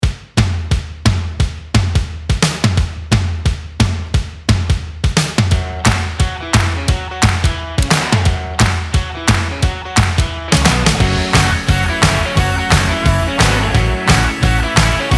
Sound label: rockpop